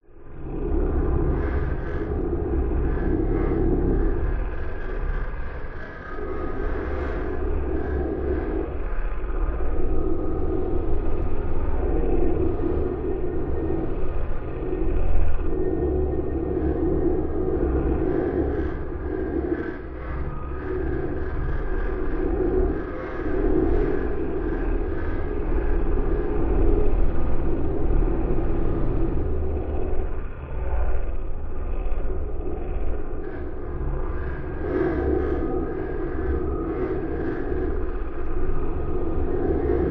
science fiction force field droning pulsating; zapsplat

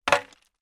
impact metal pole rusty hit loose hard surface

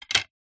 sport snooker cues x2 bash together; zapsplat

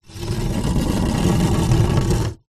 foley insulation tile drag ground; zapsplat

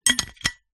household flask metal lid on

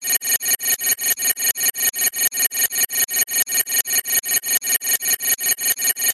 science fiction console alarm beeps

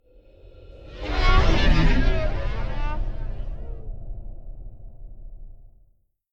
Whoosh Twisted Vocal; bottlerocket

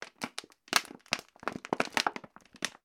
foley bottle plastic movement; sound effects one

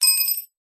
industrial wrench flat drop hard floor; zapsplat

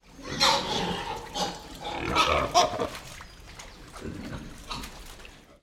animals pigs grunt inside barn wet sloppy mud

